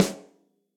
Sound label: Snare Hit & Rim Room; Drum Hits; Room With A Vista; Ableton; Factory Packs; Session Drums Studio; MPE Hits